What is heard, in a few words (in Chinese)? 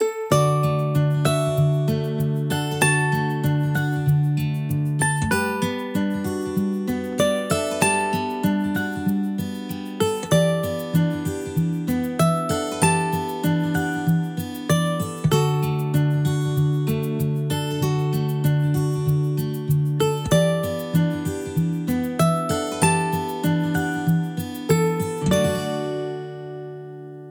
绘本BGM